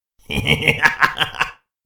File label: Hehe; Freaky Clown Town Mystery; windows